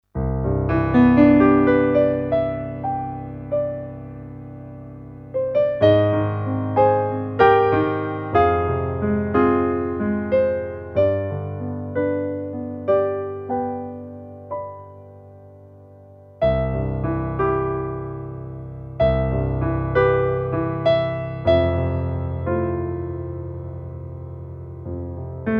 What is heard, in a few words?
Casio CDP-S360 Stage Piano